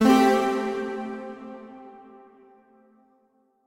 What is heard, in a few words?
Clear F0 Echo; Mixolumia; Tessellation